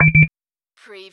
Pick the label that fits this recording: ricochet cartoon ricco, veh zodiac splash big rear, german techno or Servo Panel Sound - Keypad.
Servo Panel Sound - Keypad